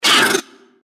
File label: mantis chase vox; npc